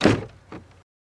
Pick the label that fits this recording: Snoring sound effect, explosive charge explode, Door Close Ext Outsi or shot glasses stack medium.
Door Close Ext Outsi